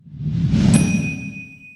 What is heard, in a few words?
intro sound